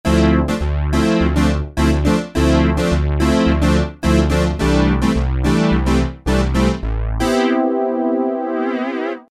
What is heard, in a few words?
cmp Danscomp